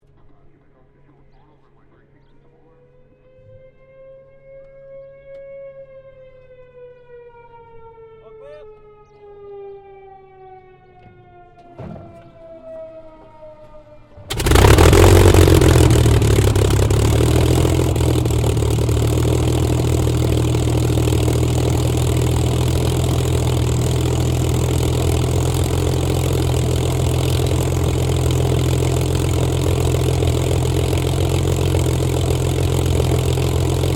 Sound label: AIRCRAFT SUPERMARINE SPITFIRE MK-XVI STARTUP IDLE SIREN STEREO; Airplanes